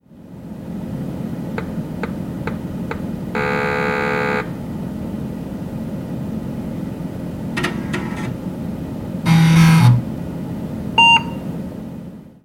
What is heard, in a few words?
post sequence with drive test, generic pc 286